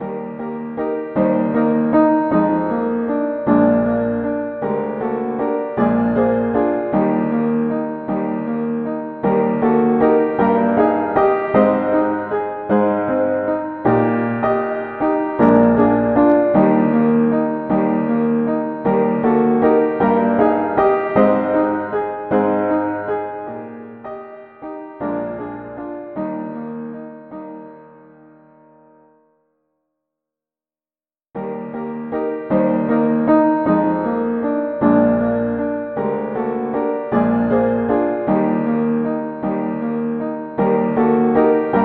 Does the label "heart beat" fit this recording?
No